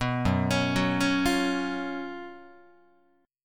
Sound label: Gb Major 7th Suspended 4th Gb Guitar; GbM7sus4; arpeggiated; 2,2,4,4,5,4; JGuitar